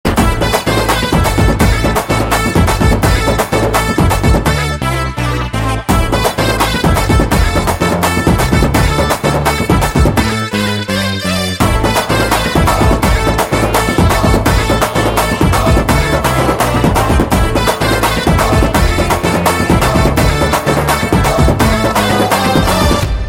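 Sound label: Mass background music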